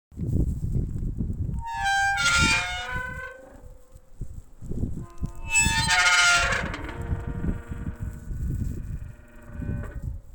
Creaky Metal Door And Spooky Wind

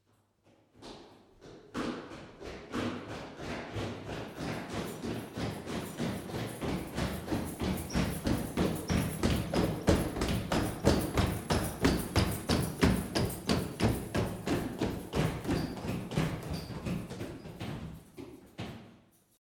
Double Police

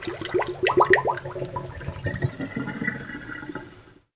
Water Go Down The Hole

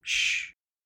Voice Shh

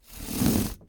Scrape

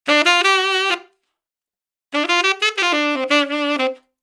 90bpm 3bar

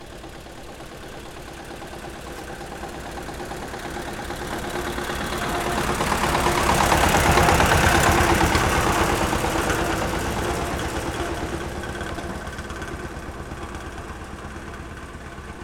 Steyr Tractor

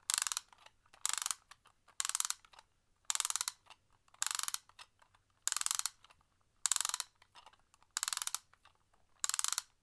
Toy Ratchet Back And Forth

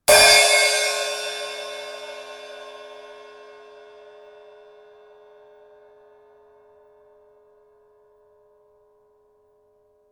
Cymbal Hard Hit

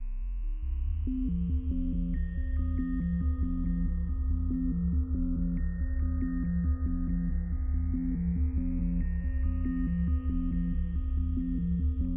Synth

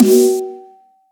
Snare; Haxim

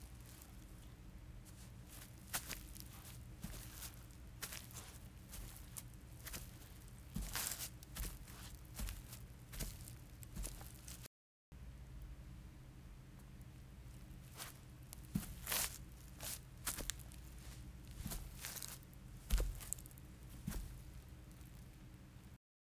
Slow Walk Footsteps On Grass

